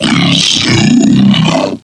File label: healer; cstrike